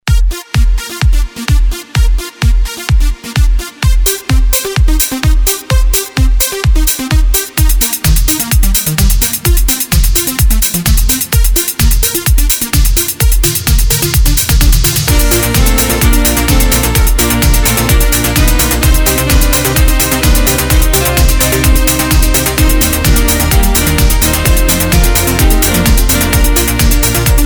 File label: Korg Pa3X